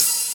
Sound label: Open Hihat